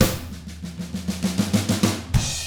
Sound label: HARD ROCK, Drums; ILIO; Double Platinum Drums 1